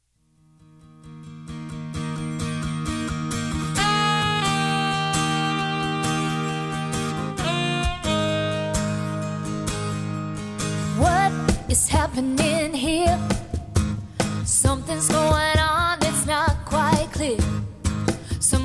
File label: quartet, Acoustic Duo, Trio and Quartet